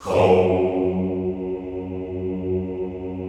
"houuu" F#1, classical choir; Best Service; Extended Classical Choir

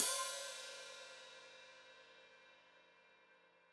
Hat Foot Open, Hi-Hat; Deeply Sampled Drums

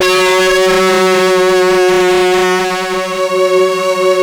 LEADS; Masterbits; Vintage Voltage

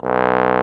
BRS BSBONE, Bs.Bone Solo; Roland LCDP06 Brass Sections; Bs.Trombones

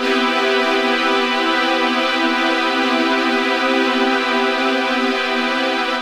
Cream Pad (min7th)

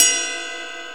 RIDE BELL; E-MU Formula 4000 Series Vol. 2; Dance Set 2

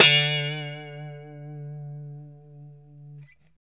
POP D 3, STRAT guitar; Spectrasonics; Hans Zimmer Guitars VOL-1; JC-STRAT POP

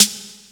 ANA SD, CD DRUMS; Crypton - DrumBase5000; CD DRUMS #2

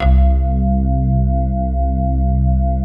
MARIM PAD, JV PADS; Pro-Rec - Synthsonic

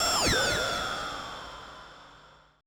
AMBI FX

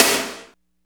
SNR, Tekno Industrial; East West

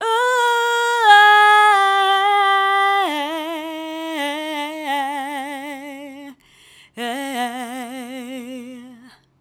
Female Vocal

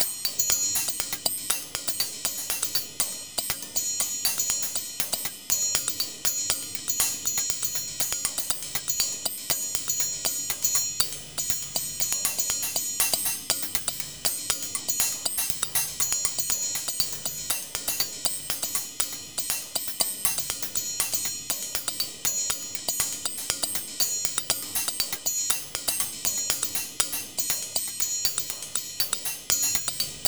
Bellz N Hatz More, Percussion Combos, 120; M-Audio; Pro Sessions VOL-38; Abstract World Fusion 2

